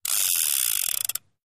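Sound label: Revolver Spin Chamber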